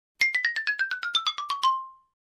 Xylophone cartoon falling sound effect

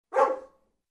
Single Dog Bark

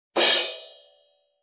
Short stopped drum crash

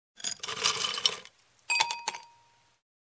Filling Ice Cubes in Drinking Glass

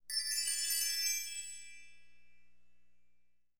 Bell tree chimes

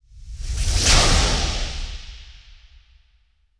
Lightning Whip

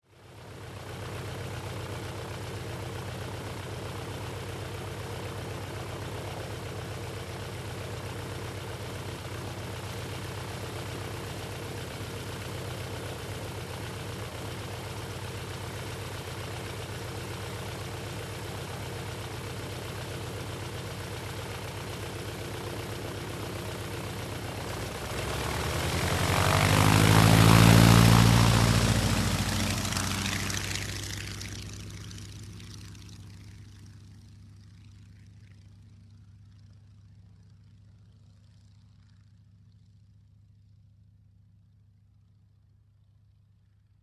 Light Aircraft Flight Preparation

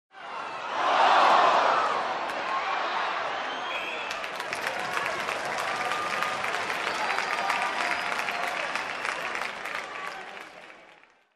Crowd Surprise reaction

